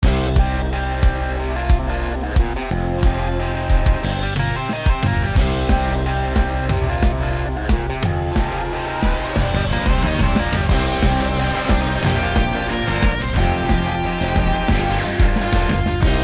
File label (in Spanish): Sintonía original; RPM Radio Profesional Motor